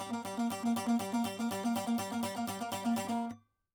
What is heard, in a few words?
saz